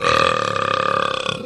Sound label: burp medium